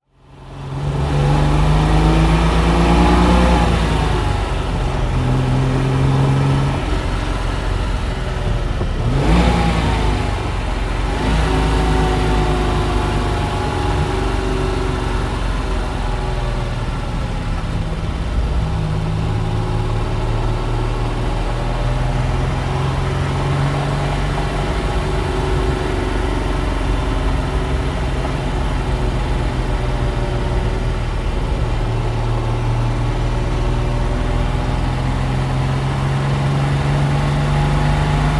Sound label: Lancia Flaminia Super Sport Zagato 1966 - Innen